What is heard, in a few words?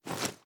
reload drum magout cloth; reloads; gmod; iw8